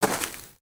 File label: Footstep; Lego; Strombie; creatures organic